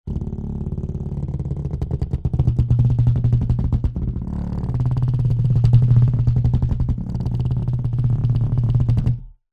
wood vibrating soft massager on wood surface bounce vibrate; foley